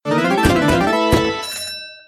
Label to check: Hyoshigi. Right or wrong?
Wrong